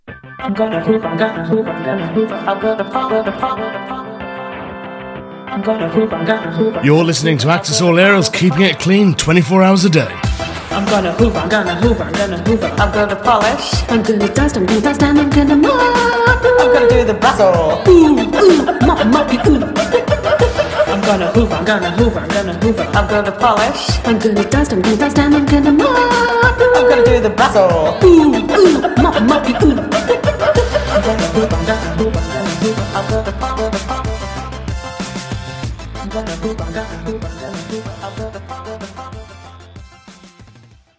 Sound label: jingle; The Clean Up; Brass Knuckles